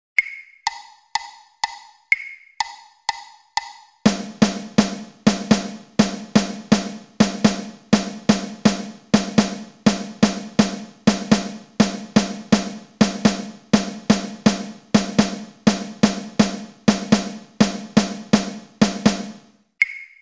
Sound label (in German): Rhythmus Party Dance Mix ohne Metronom 124 bpm